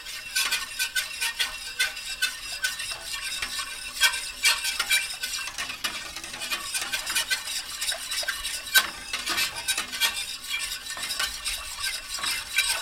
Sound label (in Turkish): metal cirpici ile sulu yemek karistirma sesi